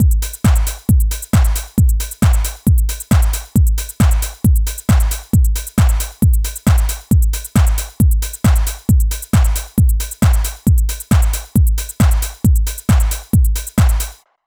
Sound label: basic loop 135, trance